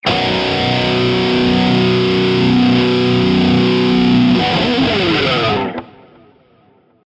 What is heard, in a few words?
Shreds Guitar Encore